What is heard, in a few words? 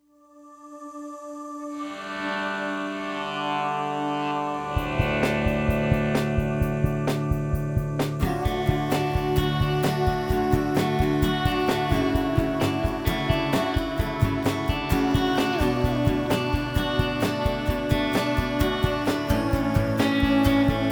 Pickup, rock